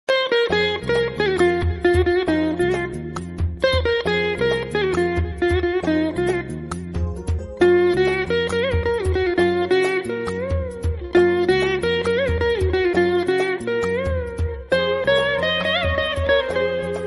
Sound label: melody bgm